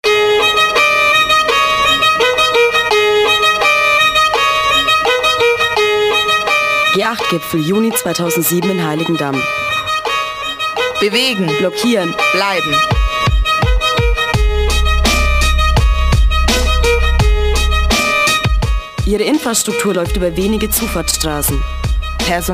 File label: jingle